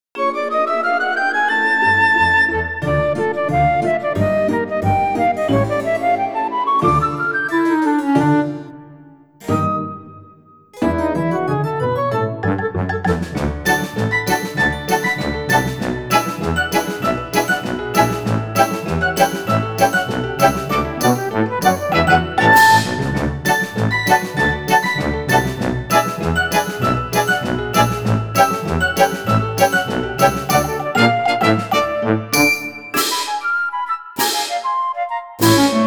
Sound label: Dressing-Up Dance, exam music